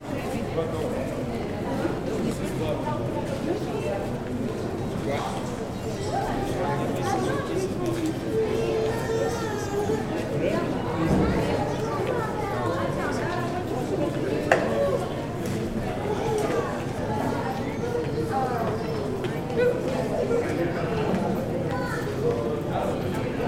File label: ambience urban city fortified cite de carcassonne france people chatter; sound effects one